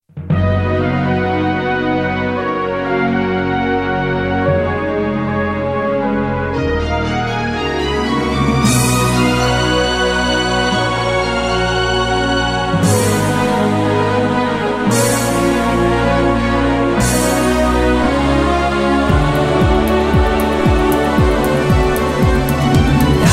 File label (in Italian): cantiamo inni al Signore